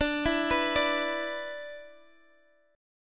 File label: B-Minor Double Flat 5th